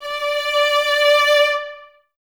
String Ensembles, Violas, VLS Detache

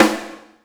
snare punch; Sonic Foundry (Sony Creative Software); Ugly Remnants VOL-2; Beatstuff

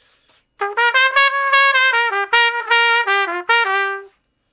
Trumpet Shuffle (G); Crimson Blue and Fabulous Horncraft 4 RnB; Horncraft for R&B; Solos

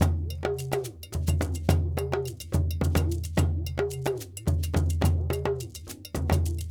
Djoun, World Percussion; Donno, Bell, Shaker

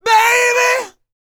ROCK, MALE HUMAN; ILIO; Vocal Planet

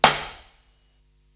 Hihat, Psychedelic Drums

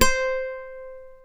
C4 FLAMENCO, GTR; AKAI S6000; WorldSounds

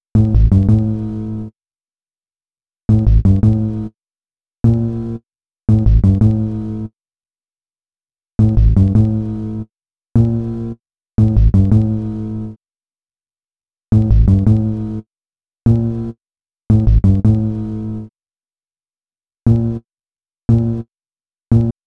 Bass, Hip-Hop; Sonic Foundry (Sony Creative Software); Lukecage Underground Soundlab Hip-Hop

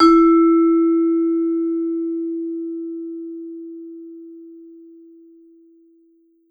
VIBE MED FF E3; Marimba & Vibes; Sampleheads; Dave Samuels

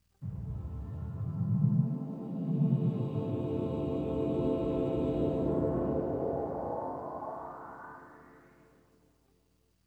PAD, Hip Hop and R&B; Best Service; ProSamples vol.01; AKAI

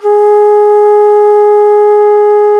T. BONSURI, India Winds

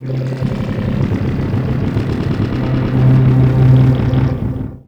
AMBIANT, Special FX Twisted; USB Soundscan; AKAI

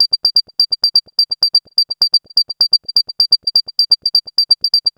VCS beat; Zero-G Ambient 1; VCS BEATS #1

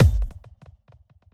Kick, Drum Hits; Trance; Zero-G Trance Inducer; 90 s Sample CDs

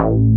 BASS, Synth Basses; E-MU Formula 4000 Series Vol. 2

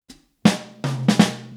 Soul Drums Intro, 70s Analog Funk; Sonic Foundry (Sony Creative Software); What it Is!

